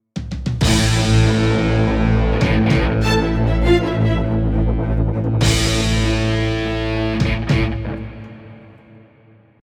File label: finalboss phase02 head 200bpm; Chicory A Colorful Tale